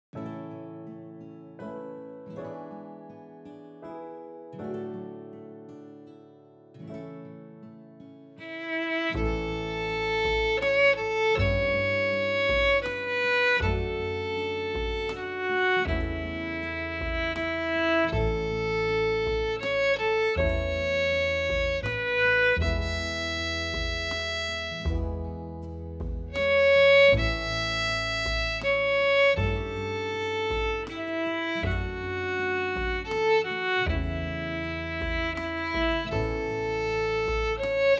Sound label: Violin